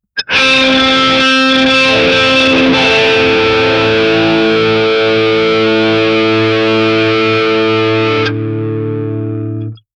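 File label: electric guitar with distortion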